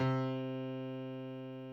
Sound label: Piano Mid